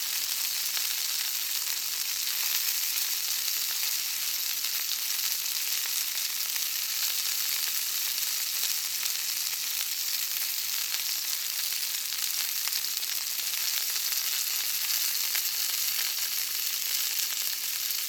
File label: fry dumpling